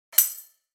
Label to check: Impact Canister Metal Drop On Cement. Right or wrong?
Wrong